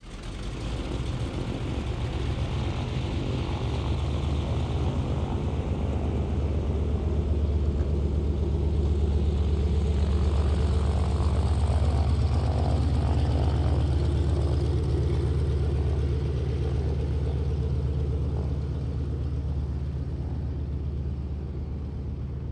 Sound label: Plane, Seaplane, de Havilland, DHC-2, Beaver, Single-Engine, Medium Distant, Idle, Taxi, Turn, Fast; Away; Airborne Sound; Vehicles Aviation